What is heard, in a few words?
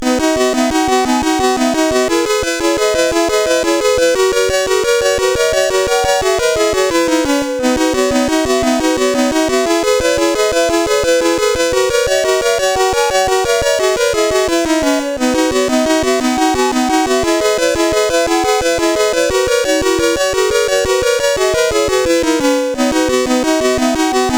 sid CSG8580R5, GAMES; Calvin